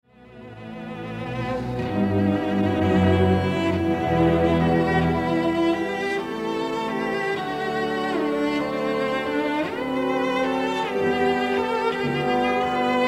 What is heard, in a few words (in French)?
concerto violoncelle; elgar; postromantisme